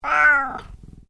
Animal Cat Tonkinese Meow; Domestic cats; Cats (Sound Effects:Animals)

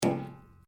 RUBBER ON METAL; Sound Effects:Materials

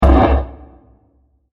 Scratch Hit; Blasts; Impacts; Crafted Signals

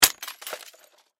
Crash Glass Debris Impact; Sound Effects:Materials; Blastwave

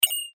Beep Tone Signal Multimedia; Web Interactive

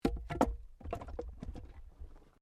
Wood Log Fall; Material components for constructing more complex sounds; Materials

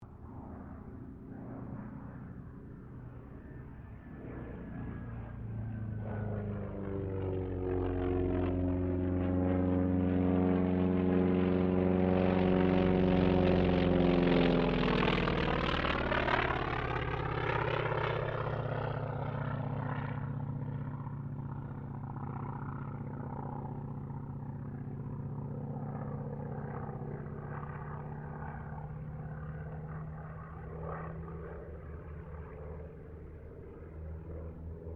AIRCRAFT T-28D TROJAN PASS; Airplane; Airplanes; Vehicles

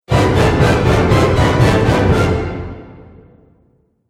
Orchestral Transition Epic; Musical

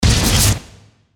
Hit Crunch Impact; Crunches; Objects; Blastwave

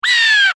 Animal Bird Blue Jay Screech; Birds; Animals

